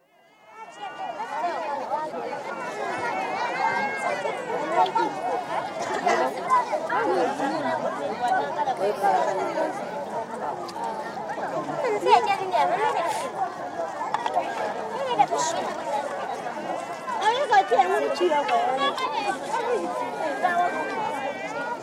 Ethiopia: Gana Beles, market crowd, with some distinct speech (Shankilla & Amharic); Outdoor Sound Effects; BBC